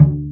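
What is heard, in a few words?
double BASS G-1; AKAI S6000 CD-ROM - Ultimate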